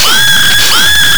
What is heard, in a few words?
SCREAM VOX R; Big Beat Samples & More; AKAI; VOX FX1 KIT